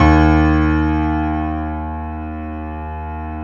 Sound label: JAZZ D#1, Keyboards Acoustic; USB Soundscan vol.17